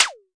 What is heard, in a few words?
Visco Space Drum; DRUM MACHINES